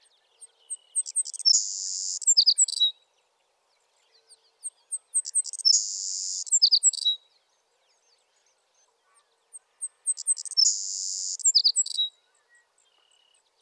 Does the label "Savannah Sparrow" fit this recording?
Yes